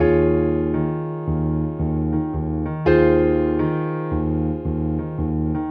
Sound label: Piano, Trip-Hop Loops; Sonic Foundry (Sony Creative Software); Rondo Brothers Trip-Hop Loops & Samples; Hard Rocks The Roof